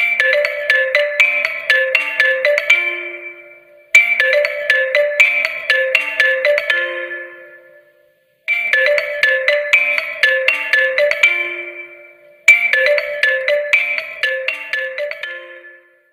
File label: Huawei Honor 50, ringtone